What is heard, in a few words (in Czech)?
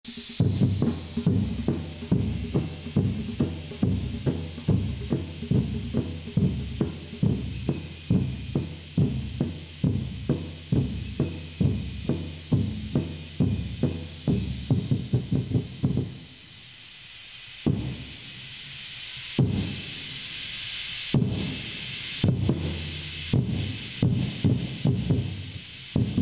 bubny, Japan